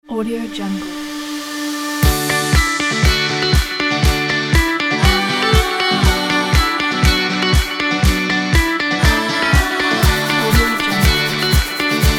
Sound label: happy; onewavestudio